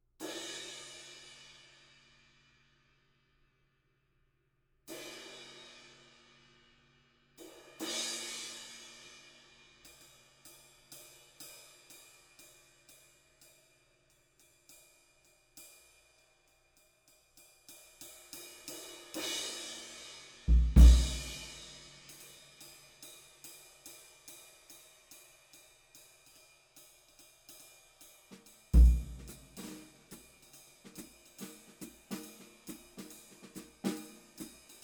A Z Thin Crash Ride

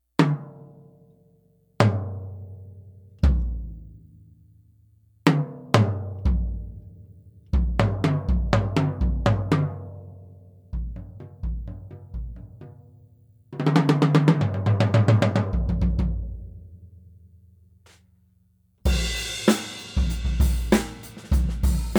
Canopus NV60 Bop Walnut Stain, Drum Set; M1 Drum Set

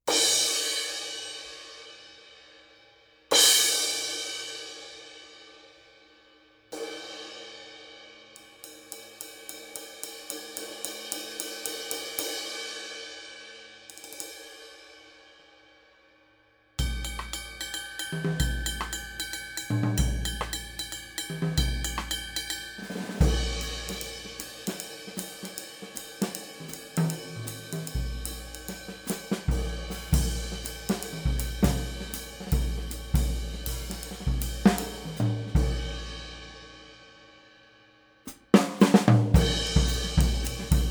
18 Session, Zildjian

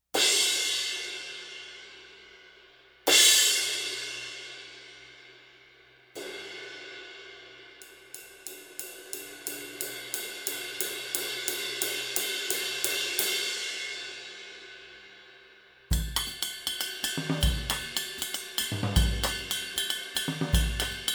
20 Bosphorus Wide

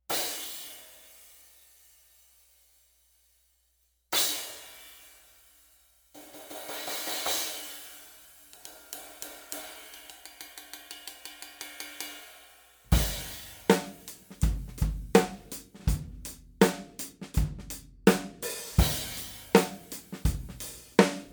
Junk Rivets; No Name 16

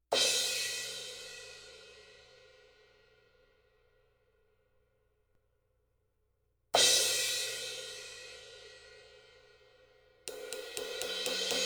18 Sabian Proto

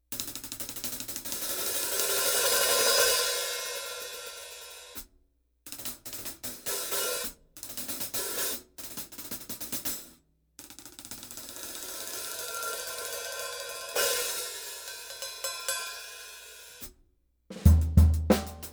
Paiste 14

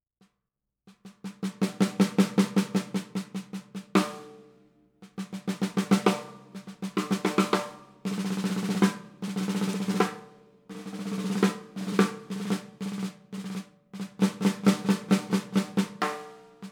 6.5x14 Gretsch Black

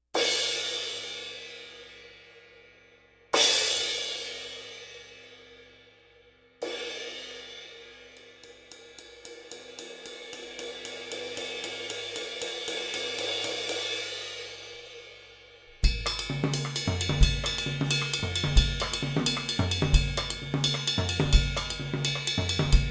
Sabian Proto AA

